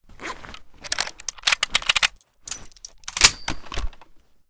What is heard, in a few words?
sks reload full; Blindside's Weapon Reanimation and Rebalance - Military